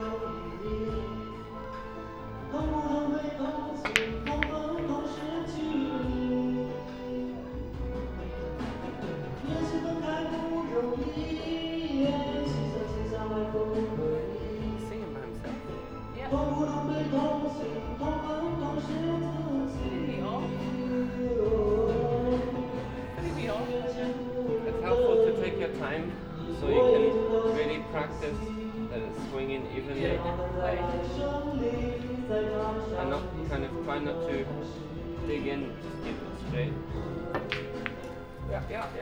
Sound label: Karaoke Singer Pool Teahouse Weinan; Sound Scapes